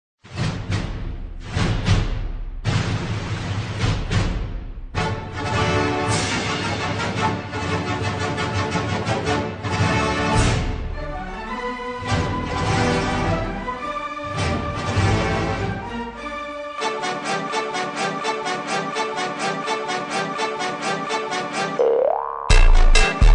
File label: Funk Ultra Slowed